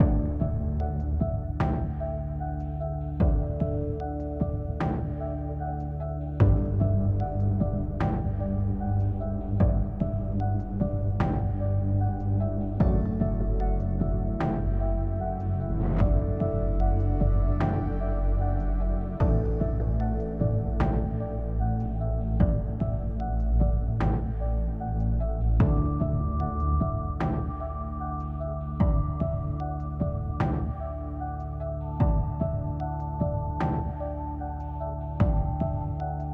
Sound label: Exploration Night, 75BPM; TheView Act3